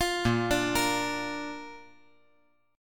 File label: Bb Major Bb down, chord; arpeggiated; JGuitar